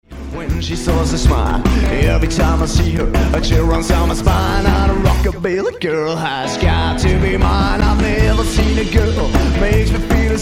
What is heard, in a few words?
Rockabilly